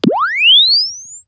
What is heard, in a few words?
Press Two Sides Chirp; Samsung 4 Recordings